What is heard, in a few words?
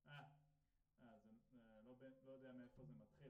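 Snare Bottom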